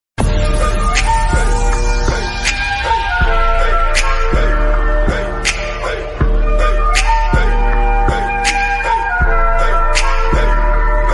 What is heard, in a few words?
cool bgm